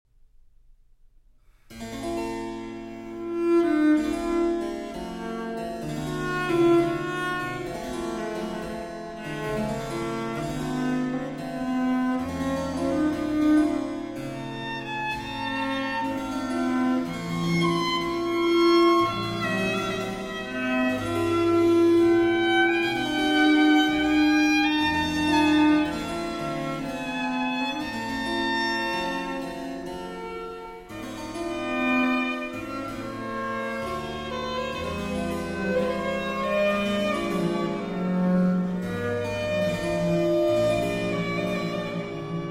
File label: Trio Sonata in B Minor, Prélude, Lentement